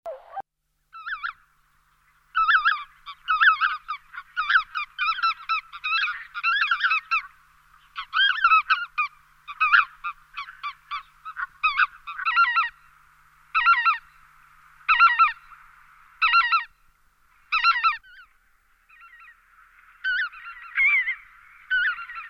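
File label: piskulka golos, lesser white-fronted goose voice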